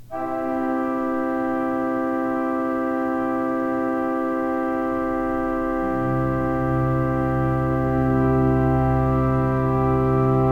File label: crescendo floor, pipe organs; St Mary; This illustrates what the Crescendo can do, though it is rarely used in this capacity.